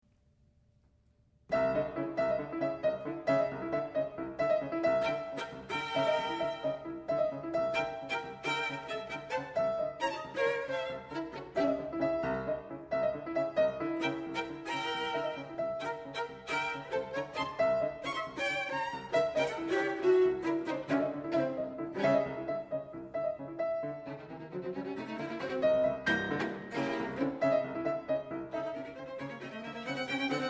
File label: piano trio